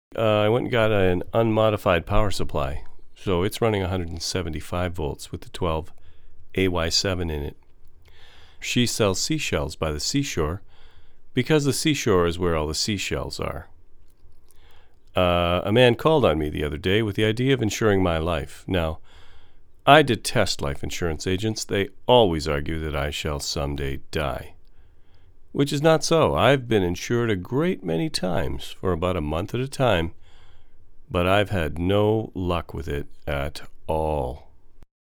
APEX 460 Mini-Mod

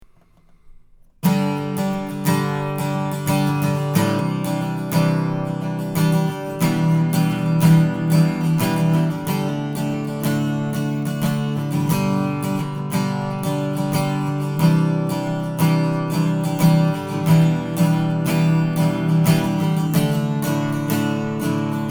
Acoustic Gtr; FAR 47

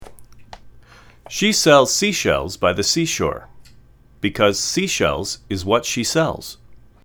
seashells

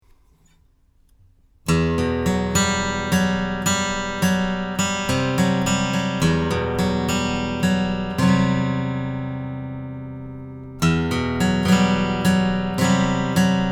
Standard Gtr; FAR m251

